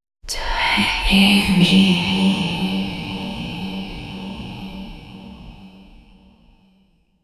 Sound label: gentle female choir voice